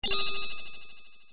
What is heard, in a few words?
ting; ff3us